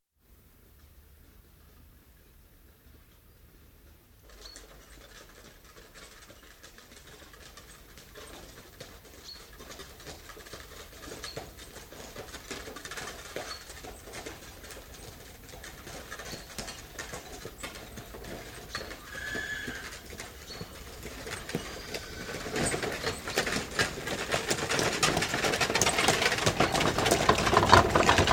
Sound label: One horse & cart pass at walk; Horses; Animals; Horses Carts; BBC